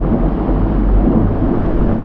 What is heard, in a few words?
earthquake